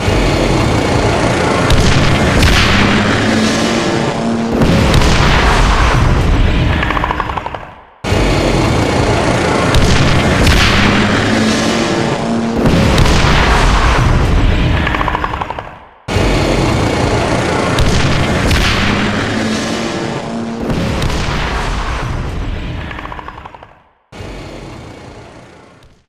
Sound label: Chopper Crash; Alien vs Predator Requiem; PSP